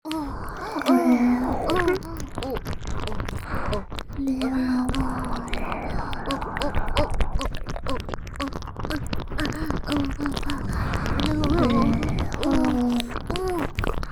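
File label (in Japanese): オホ喘ぎ＋ぐぽぐぽW耳舐め; 3WAYループ; SE有; おまけループ（オナサポ）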